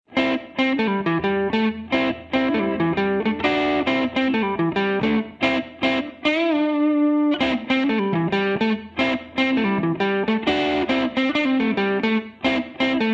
Bluesy

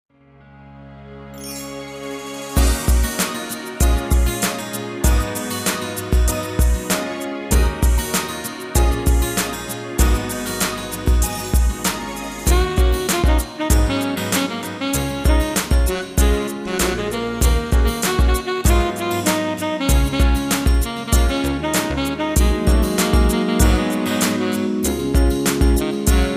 music horn sample midi

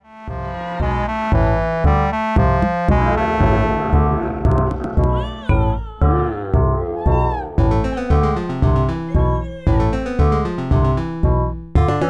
destruction village

